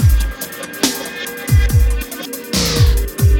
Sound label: Ambi Groove